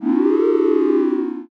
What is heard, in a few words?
ooh